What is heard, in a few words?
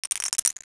SHUF CHIP, CASINO; IMSI MasterClips